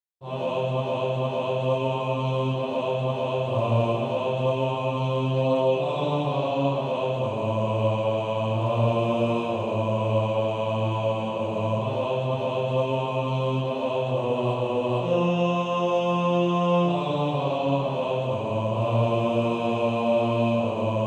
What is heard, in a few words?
Male Choir